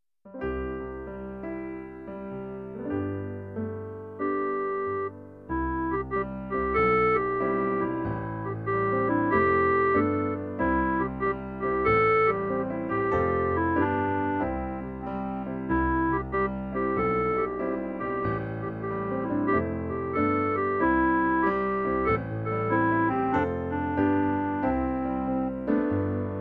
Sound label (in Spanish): CLARINETE; Walk together children